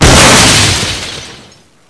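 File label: truck explosion; hl2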